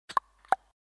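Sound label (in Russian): uvedomlenie vk, VK notification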